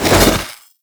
Melee Whoosh; Warframe; Sentients; Sentient Trooper

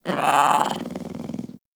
Kitten Speak Playful; Warframe; Catbrow

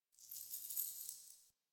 Idle Head Shake; Ambience; Warframe; Entrati; Tagfer

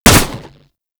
Auto Crossbow Shot; Warframe; Weapons; Tenno

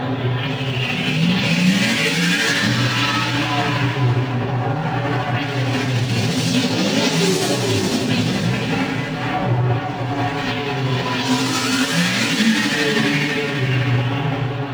Outpost Energy Field Loop; Warframe; The New War; Narmer Outpost

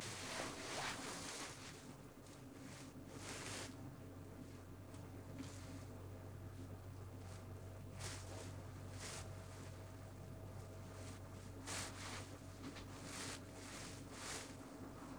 Blade Against Throat SFX; Warframe; Duviri; Cinematics; Teshin

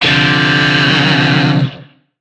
Shawzin Maj Chord D; Warframe; Lizzie Shawzin; Chords

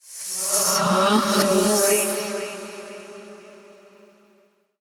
Hand Compass Vocal; Warframe; Duviri

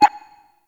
Interior Orb Station Console Rollover; Warframe; Tau; Orbital Station

